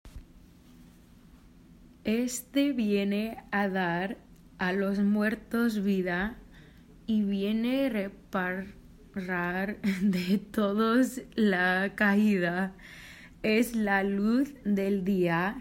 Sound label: RIU RIU CHIU SOLO Verse